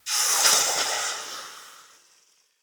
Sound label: Steam Sizzle Hit